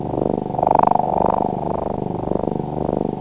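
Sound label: black hole; The Rift; Throughwave Technology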